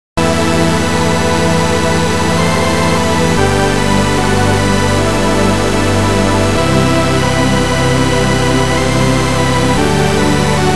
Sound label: OSC A B SUB